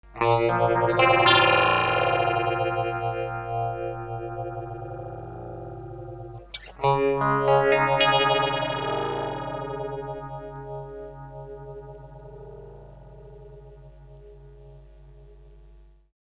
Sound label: Radius Tremolo Sine LFO; Red Panda